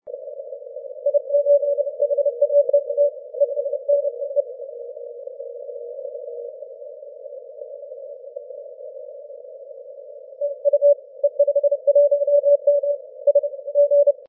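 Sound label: 20m continuous wave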